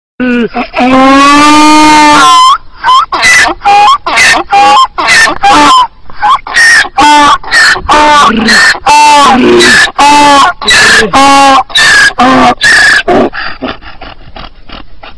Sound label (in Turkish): Cep Melodi